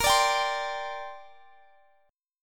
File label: Bb 6th Add 9th, Guitar, Open F; strummed; x,x,22,21,22,19; Bb6add9